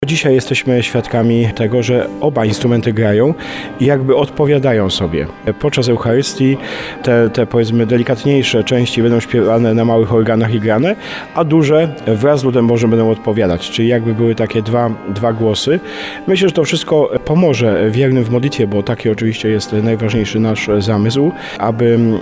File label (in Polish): organy godzinkowe